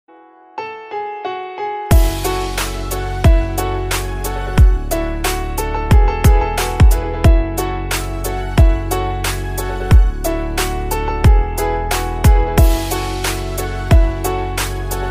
instrumental ringtone, bhakti instrumental ringtone; har har shambhu